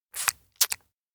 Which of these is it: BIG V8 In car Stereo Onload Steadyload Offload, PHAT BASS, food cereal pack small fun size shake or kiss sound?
kiss sound